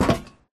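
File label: Grunt Movement Footstep Walk Metal Grate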